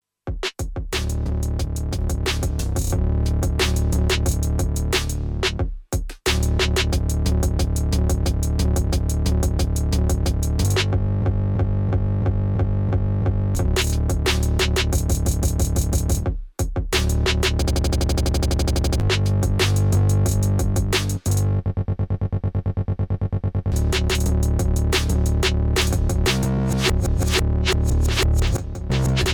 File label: Novation Circuit Rhythm Grid FX